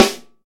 snare sample g key, steel snare drum tuned to the G note. Loudest frequency: 1993Hz